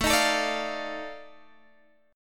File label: F 13th A, chord